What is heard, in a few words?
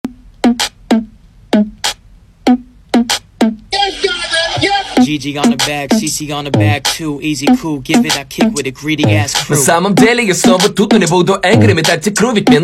rap